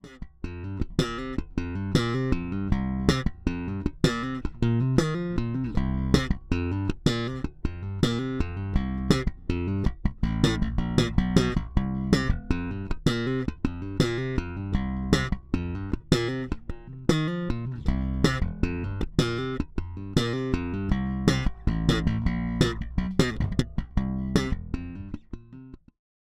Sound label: Lakland 55 94 Deluxe Both Pickups